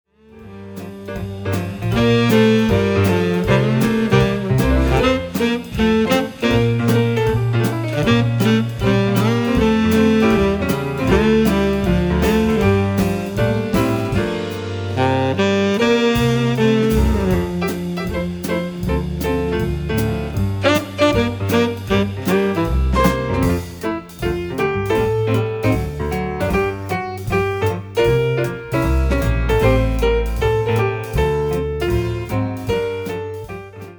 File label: instrument